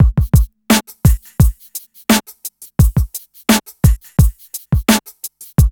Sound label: BEAT, Hip Hop R n B; USB Soundscan vol.19; AKAI